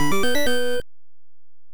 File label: Coin; Gradius; NES